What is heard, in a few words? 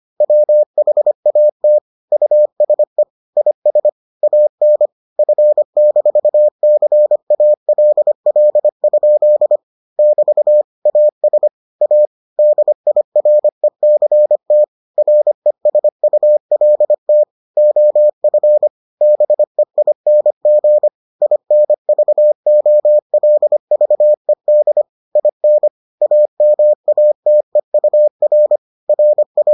morse